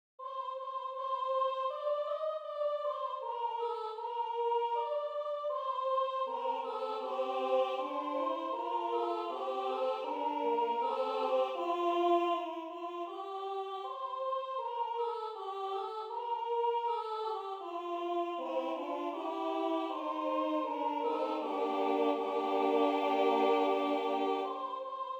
Sculati sculati boieri mari, Basarabia, colinde

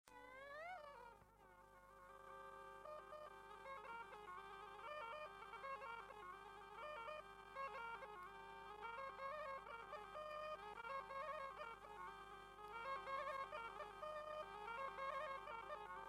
Hora lui Mereuta Lupas la cimpoi; jocuri si cantece batranesti; Vaslui

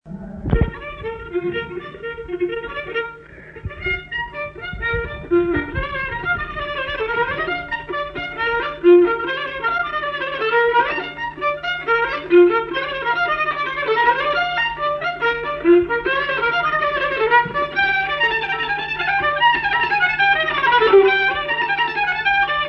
Hora I la vioara; jocuri si cantece batranesti; Vaslui

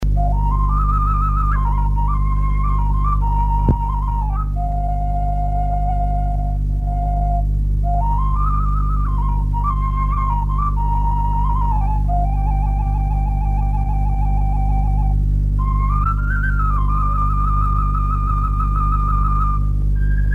Doina la fluier; Fonoteca Arhivei de Folclor Vasile Adascalitei - CJCPCT Vaslui; cantece de dor si jale

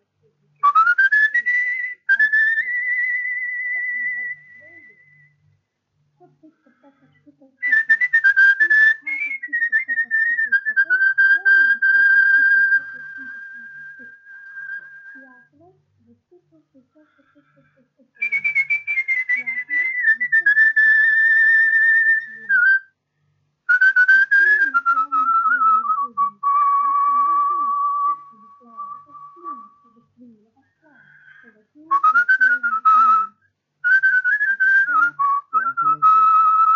Doina fluierat; Vaslui

